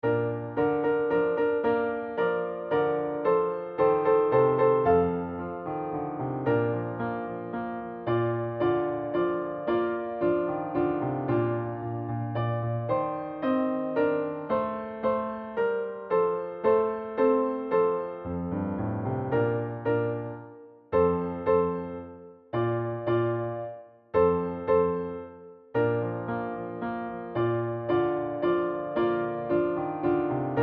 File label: menuett performance, band